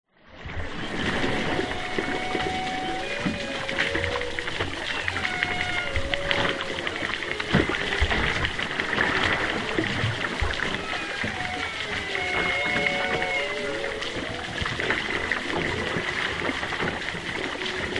whales humpback underwater distant east australia choppy water; water movement; zapsplat